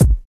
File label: good steel kick drum sound a key